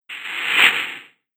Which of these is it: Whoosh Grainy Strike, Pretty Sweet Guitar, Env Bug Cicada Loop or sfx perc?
Whoosh Grainy Strike